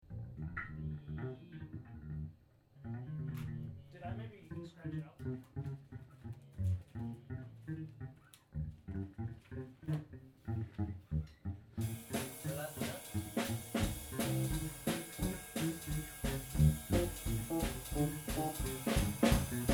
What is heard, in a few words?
Quick Blues